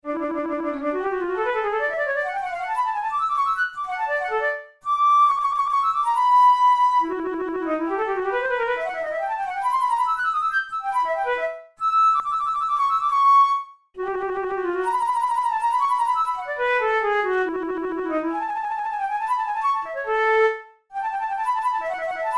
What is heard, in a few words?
studies for the boehm flute; drouet 72